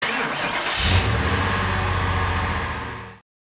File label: truck engine on; coolmathgames